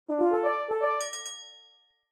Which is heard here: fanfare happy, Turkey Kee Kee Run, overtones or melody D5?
fanfare happy